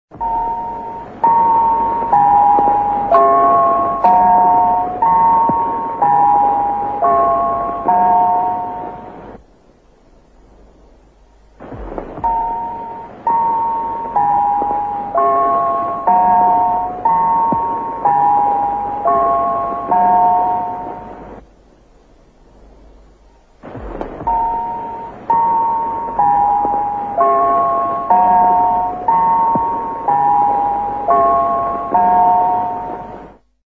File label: interval signal; mtr budapest2 1942